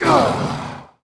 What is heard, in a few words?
fall; monster; fox ninetail